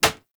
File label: Bow Impact Default; Weapon Impacts; Player; Bows; Hunter Bow; Environment; Envoy Hunter Bow